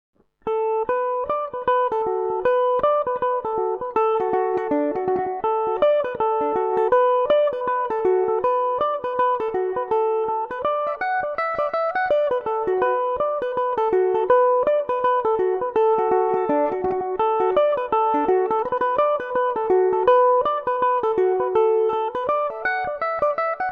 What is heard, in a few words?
earls chair dmaj, mandolin